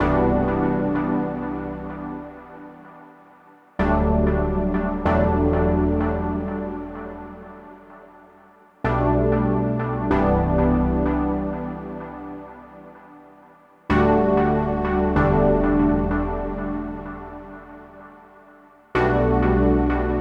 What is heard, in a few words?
synthwave chords dry; wavefield